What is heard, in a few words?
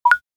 Notif